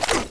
throw gun

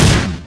m2 explode

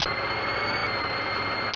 minigun empty

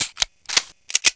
colt 1911 reload

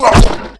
head chop

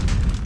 fire crack

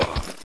fall hard

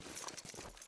prone move

